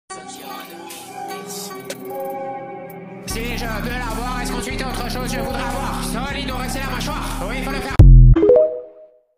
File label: quebec rap